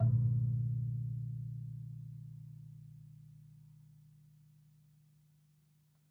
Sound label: Classical Guitar Marimba Chunks; Classical Guitar Extended Technique Stereo